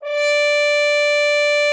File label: D5, FR HR MUTE; Xsample VOL-3